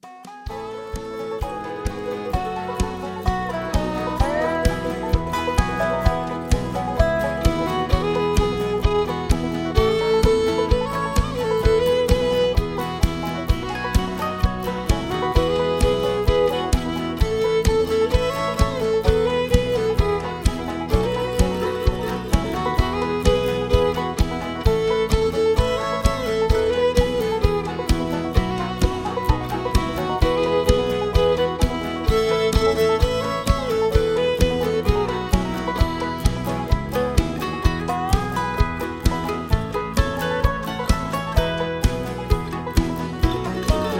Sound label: Instrumental